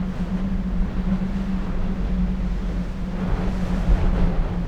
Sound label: Wind Light; 1944 Battle Of The Bulge